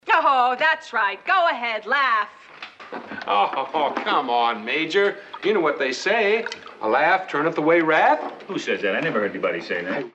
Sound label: go ahead laugh